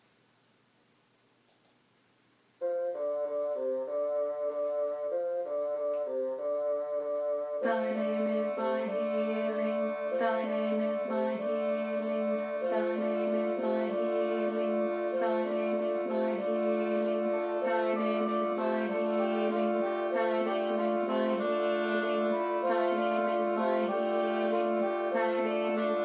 tenor voice

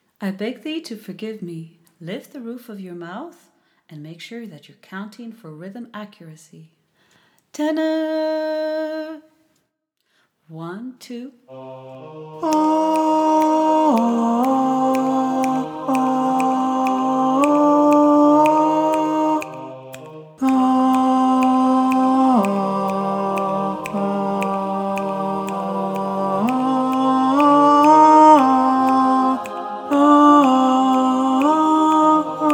tenor, choral music

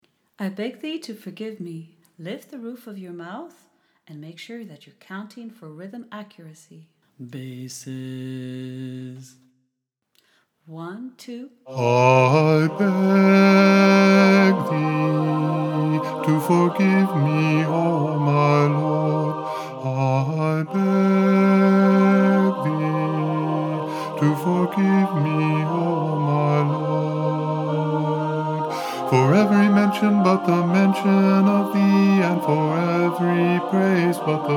bass, choral music

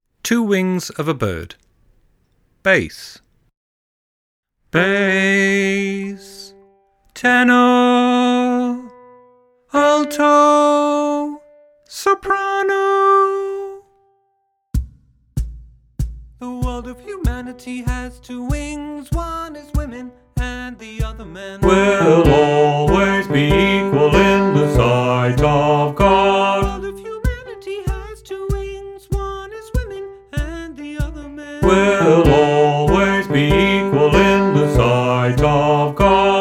bass, choral music

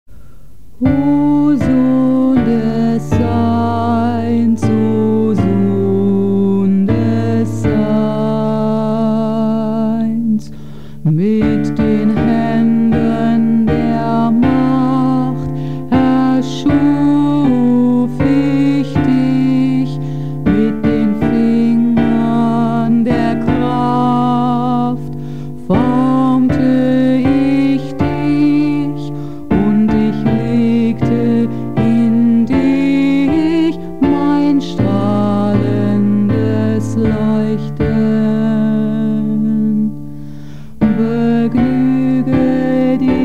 mit den händen der macht, tenor, German

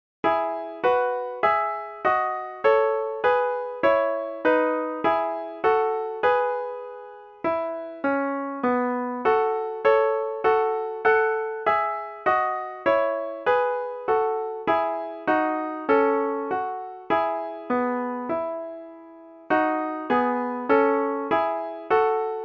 full instrumental

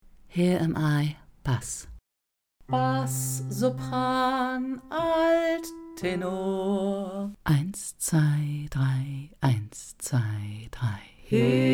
bass; here am i

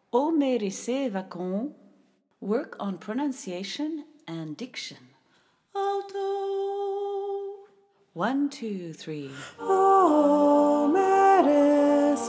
alto

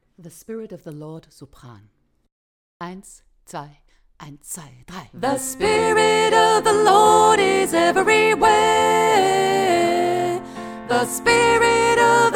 soprano

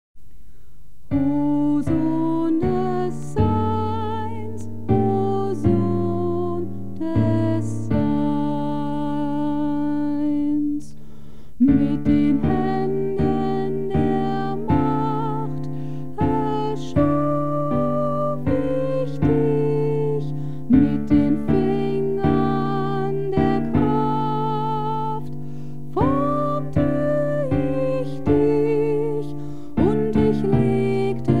soprano

